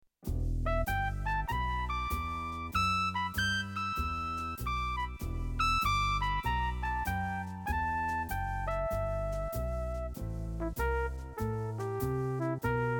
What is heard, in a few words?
pent